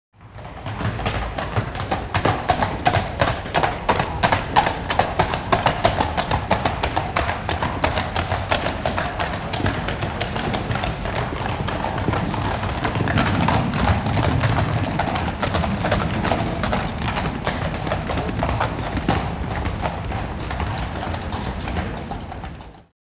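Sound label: By Horse Carriage Trot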